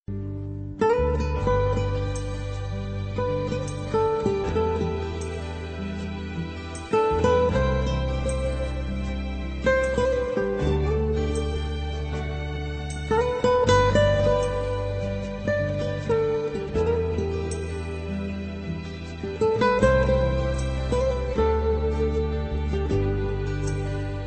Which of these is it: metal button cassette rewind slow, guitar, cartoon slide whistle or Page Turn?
guitar